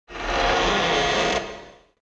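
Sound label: door open; easyrpg; WitchsHeart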